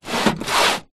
industrial tool box metal old medium slide movement on table